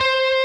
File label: prebend full Left-hand vibrato